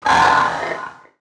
damage, fractal guardian; Legacy

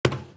Footstep Metal; Footsteps

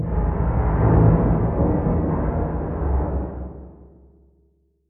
Hull

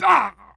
male damage; Human

